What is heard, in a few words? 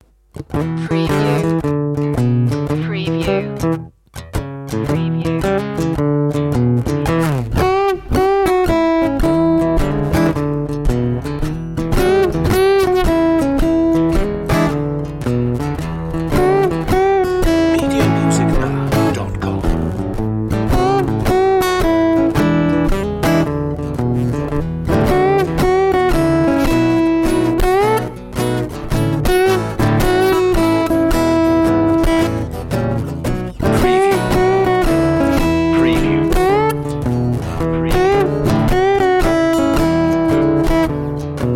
steel guitar